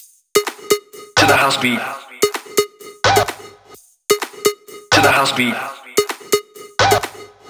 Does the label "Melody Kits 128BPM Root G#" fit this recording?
Yes